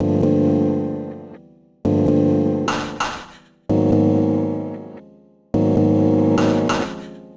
Chd Fx Loop 130; Equipped Music; Loungin House

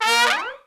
SECT BRASS FX

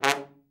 trombone; Best Service; Killer Horns

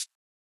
Shaker, 120bpm; Big Fish Audio; Old Skool House; Construction Kits; House of Amnesia

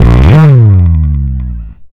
fuzz, bass; Spectrasonics; Bass Legends

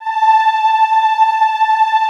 Classical Choir, A4; Best Service; Extended Classical Choir

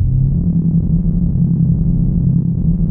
JUP.8 C2, JUPITER 8, Rare Synthesizer; Hollywood Edge - Giorgio Moroder Rare Synthesizer Collection

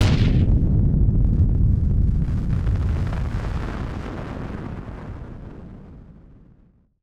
Firework FX; musicradar; bomb factory

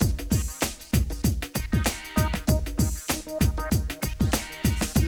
break; Zero G - Man Machine

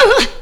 vox special

